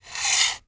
Weapon Butcher Knife Dragging Across Knife Sharpener; AdobeCC